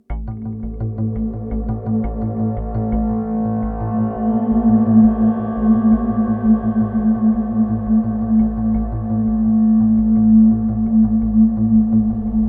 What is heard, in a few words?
Ambient Tension; Polpot